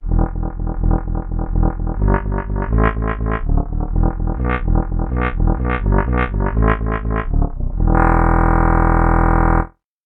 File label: muted trumpet bass